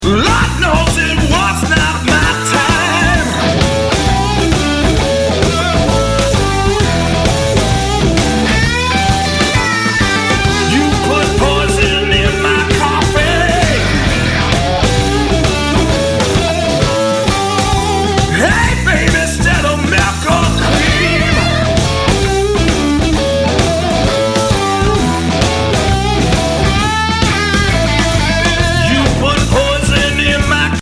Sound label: Blues Medley